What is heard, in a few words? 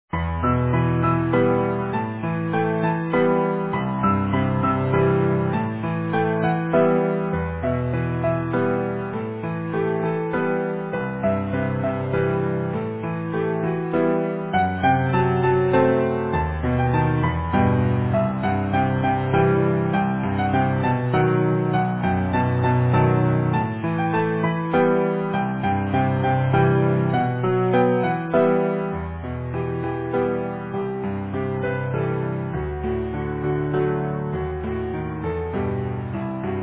easy piano